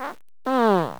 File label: fart